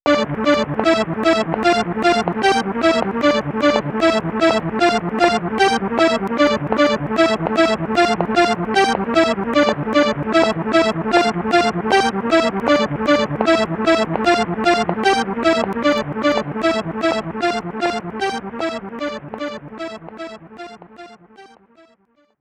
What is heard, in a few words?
Gramophone; Clock Tower 20th Anniversary Sound Collection